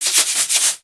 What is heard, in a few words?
Welder Shake; foley